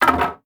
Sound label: Plank Hit